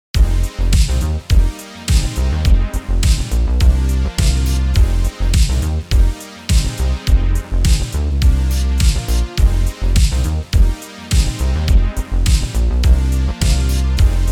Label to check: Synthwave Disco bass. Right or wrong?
Right